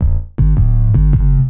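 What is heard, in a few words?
synth bass, drum and bass, 160 bpm, C; eJay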